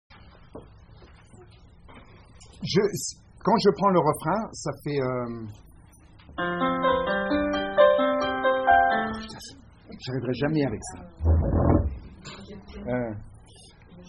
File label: tutti